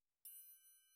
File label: TRIANGLE CLOSE